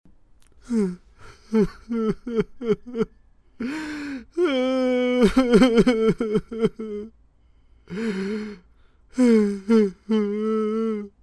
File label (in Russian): plachet muzhchina